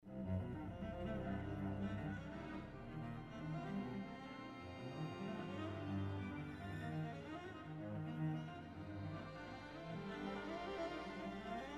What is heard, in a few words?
drone